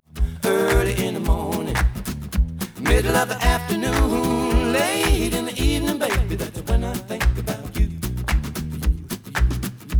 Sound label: Stereo output noised; 3bit